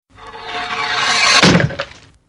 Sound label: guillotine; jocly